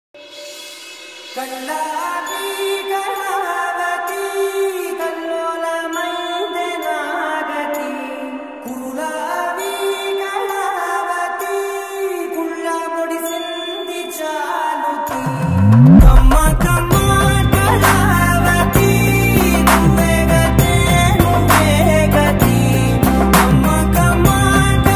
slowed reverb, tamil ringtone

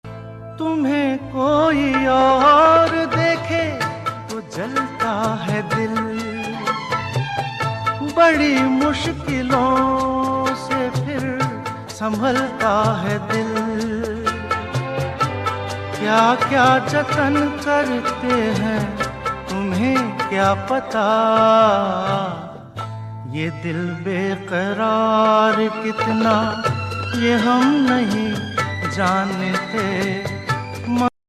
male ringtone

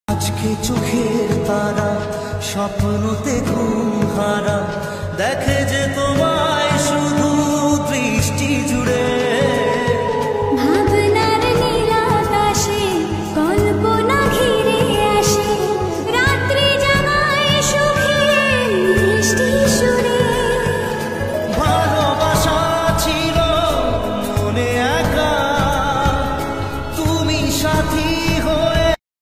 bengali ringtone

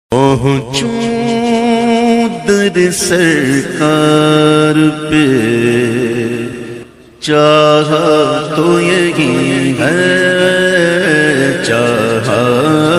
islamic ringtone

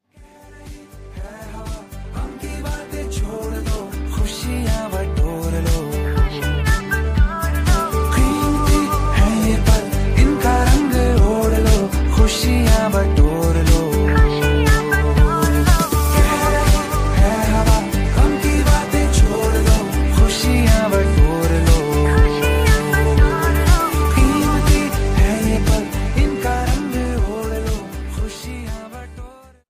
ringtone

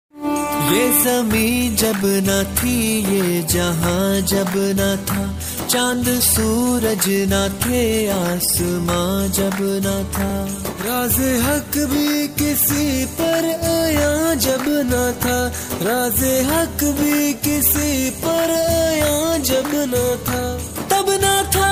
islamic ringtone

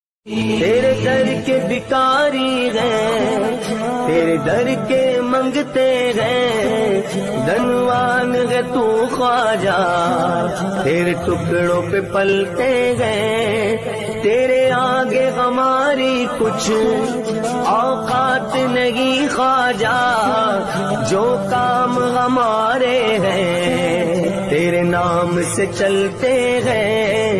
islamic ringtone

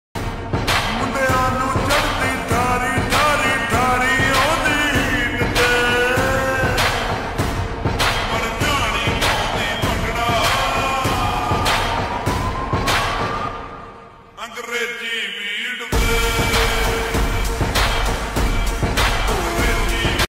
slowed reverb ringtone

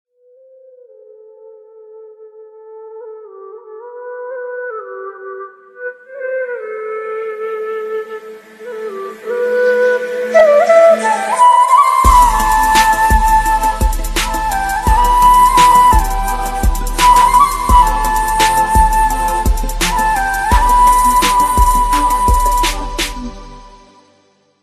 Tamil BGM Ringtone

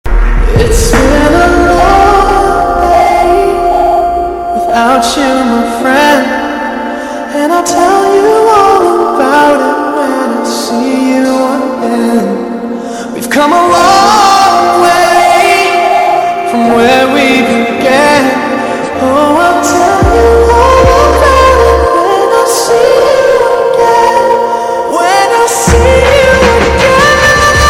lofi english ringtone

